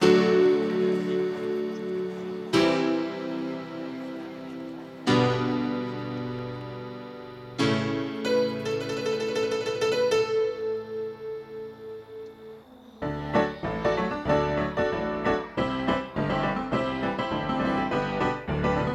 (Instrumental); My Little Pony OST Repository; Season 1; Bonus Music

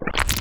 slosh; Lewd SFX

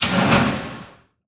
Switch

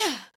Grunt; Lewd SFX

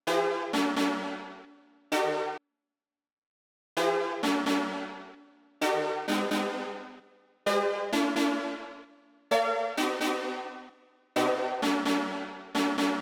brass; 130BPM; Pony Fan Music Remix Stems

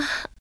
Moan

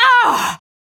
Short Scream; Lewd SFX

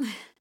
Breath; Lewd SFX

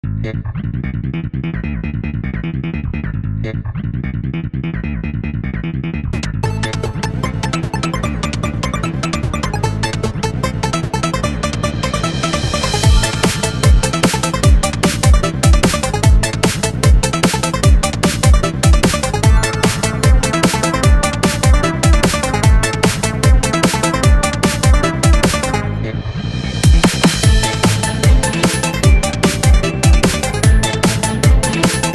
slap bass machine

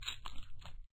slosh; Lewd SFX

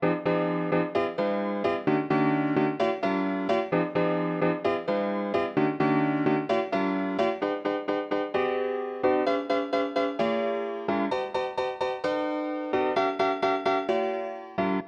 piano

